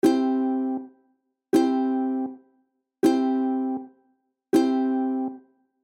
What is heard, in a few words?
ukulele strumming dd; ukulele schlagmuster anfaenger lernen